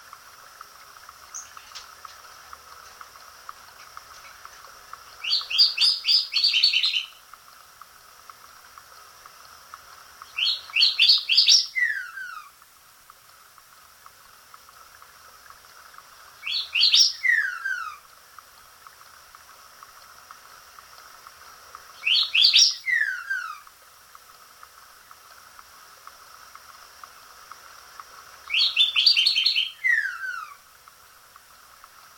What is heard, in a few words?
cardinal